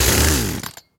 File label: chainsaw deselect; kfsounds; css